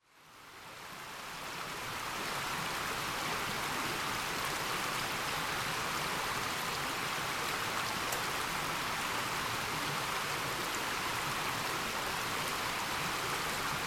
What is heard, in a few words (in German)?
Hoerbild Wasserlandschaft; Tinnitus; tinnitus erfolgreich beeinflussen